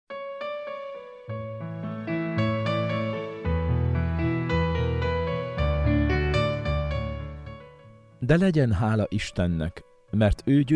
Jingle; Tematikus napok; De legyen hala Istennek mert O gyozelemre segit minket

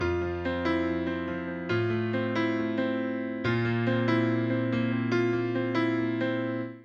f major grand piano classical 140 bpm; LOOPS

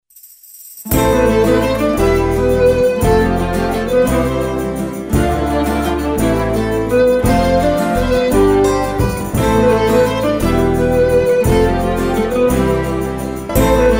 Jingle zene; Hitigazsagok szivnek es leleknek

